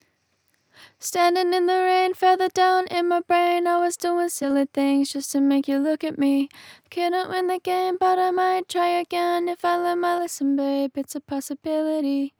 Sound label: Vocal Harmony; Loops; Tonal; Ableton Live 12 Lite; Core Library